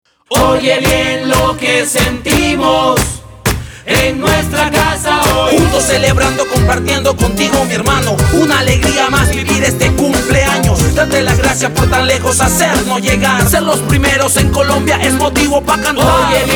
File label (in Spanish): jingle; HACEB; sin pata